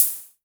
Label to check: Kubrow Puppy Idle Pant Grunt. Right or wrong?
Wrong